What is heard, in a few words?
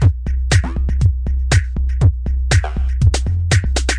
lotsa bottom, 120 bpm, drum loops and breaks